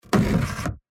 wooden sliding door

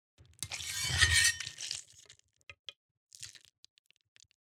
pulling out KATANA

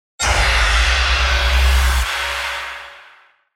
UI comform sound

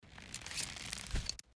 pulling arrow

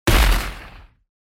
desert eagle

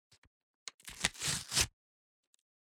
paper tear off

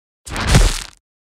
SMACK

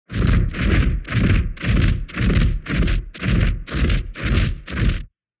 March

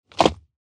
landed on the ground

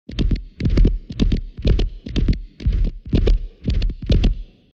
horse inside the castle

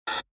UI invalid sound

